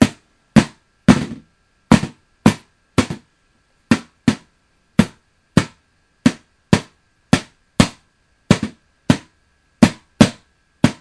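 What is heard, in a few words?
drum practise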